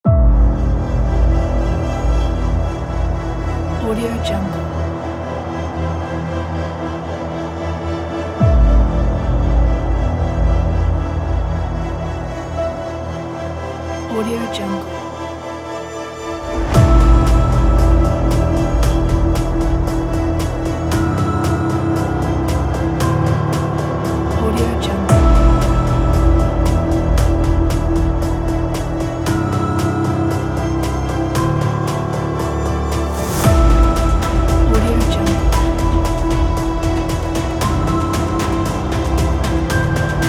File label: future cinematic background